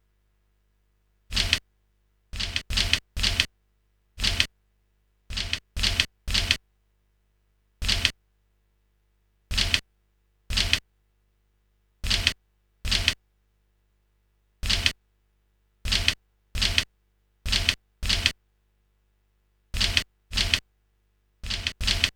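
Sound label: stereo instrumental walkman loop 120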